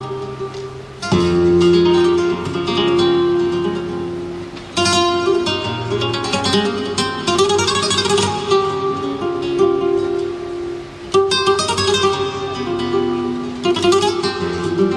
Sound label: spanish guitar